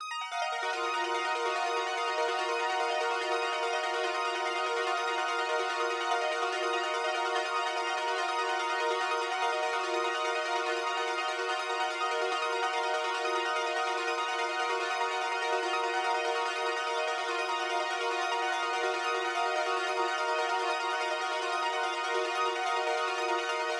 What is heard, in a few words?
145 BPM D# Min Arp, Analog; Melodies; Loop Stems; Cymatics; GHOST (Beta Pack); Emerald